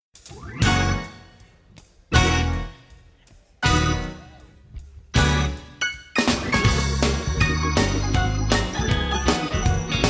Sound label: Piano intro & instr; gospel piano